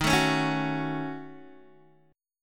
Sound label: D sharp Minor 7th Flat 5th D sharp down Guitar Open D